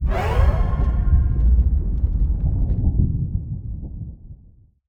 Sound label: wild screen shake; netent; starburst xxxtreme